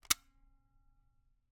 Camera, Kodak Extramax, pocket, 110 film, plastic, metal, snapshot; Eiravaein Works; Vaeyan IV